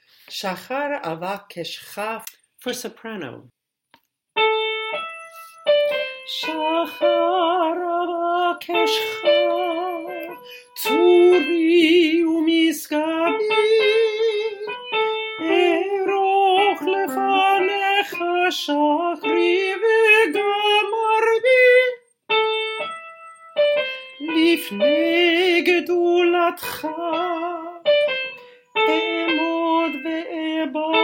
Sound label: Shachar Avak soprano